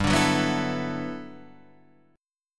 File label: G Major 13th, Guitar DADGAD